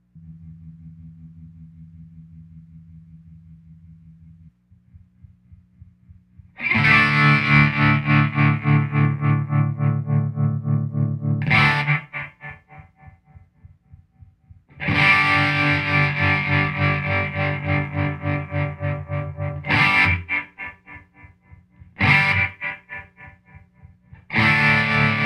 Telecaster Tremolo Bridge PU Neck PU

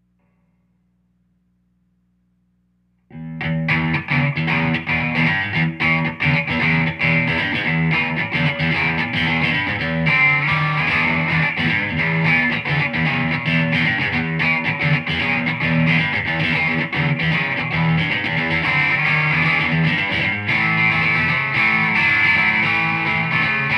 Les Paul Rock Bridge PU Clean

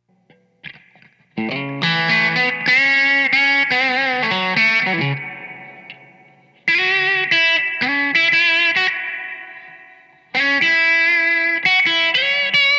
Strat Country Bridge PU Light Grind Reverb

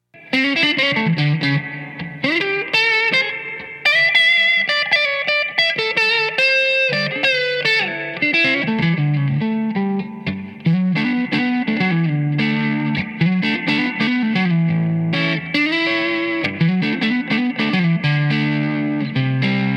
Strat Shuffle Neck PU Moderate Grind Reverb

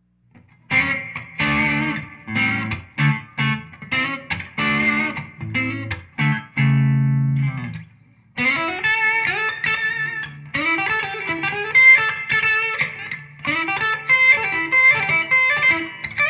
Telecaster Blues Neck PU Light Grind

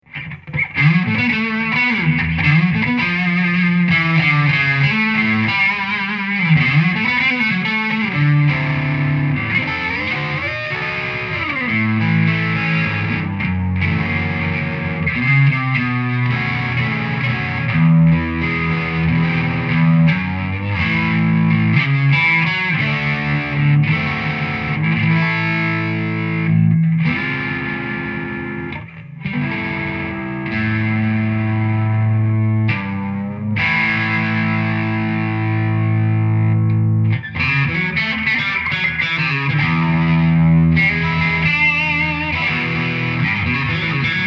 Telecaster Blues Neck PU Both PU Moderate Grind

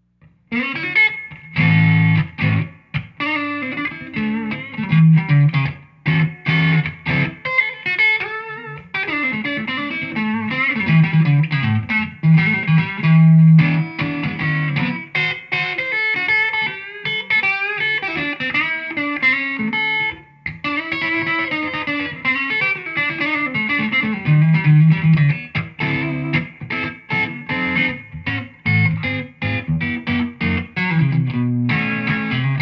Stratocaster Swing Various PU Light Grind

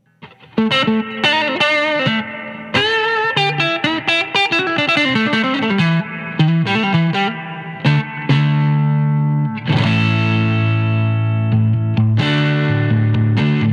LP Rock Neck PU Light Grind Reverb

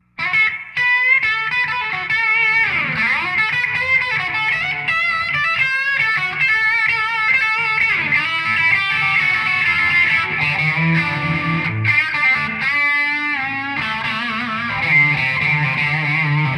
Telecaster Blues Bridge PU Neck PU Moderate Grind

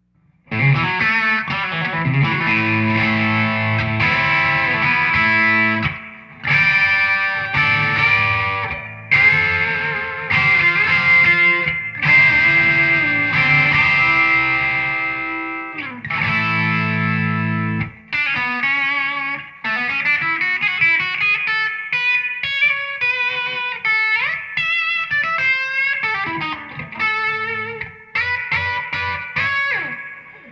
Telecaster Modern Country Bridge PU Light Grind

